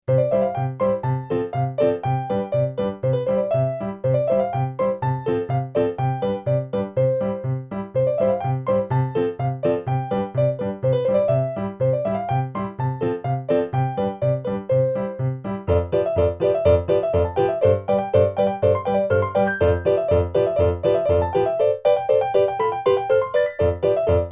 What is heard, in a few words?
Piano VGM Covers